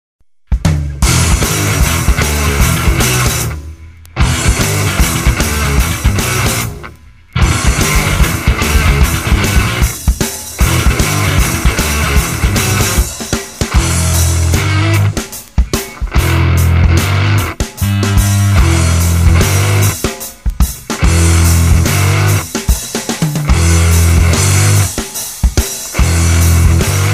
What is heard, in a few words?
instrumental